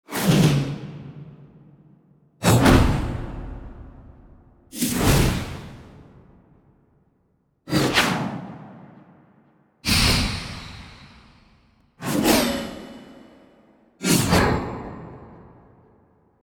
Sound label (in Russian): nabor nauchno-fantasticheskih filmov dlya pereklyucheniya effekta zhuzhzhaniya